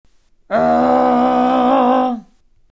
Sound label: Growl